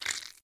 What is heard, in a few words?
wave